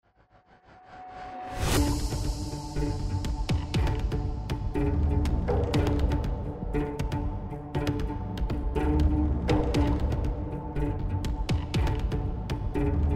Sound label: song